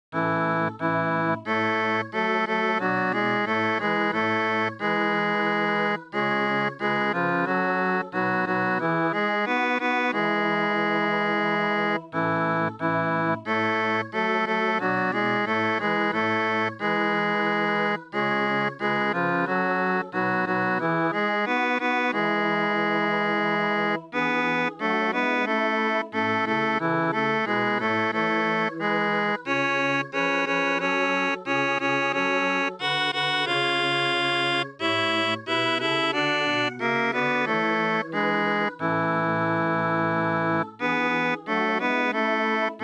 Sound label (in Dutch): tenor; deutsch gloria